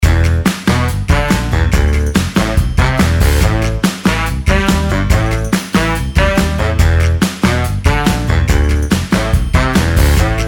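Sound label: Brass Arrange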